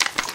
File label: weapon footstep, metal; physics; Garry's Mod; hl2